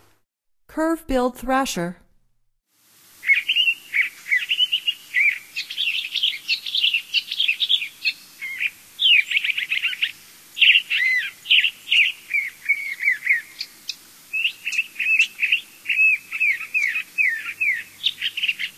Curve-billed Thrasher, Songs of Birds of North America; Stokes